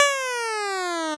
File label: 8bit se